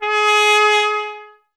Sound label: trumpet, G#0; Miroslav Vitous; Solo Instruments VOL-1